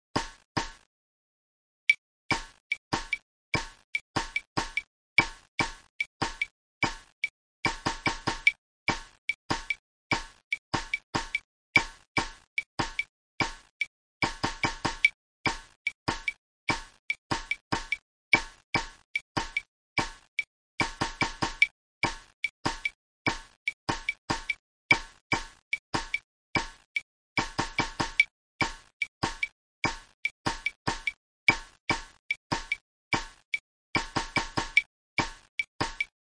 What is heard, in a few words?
funk tam click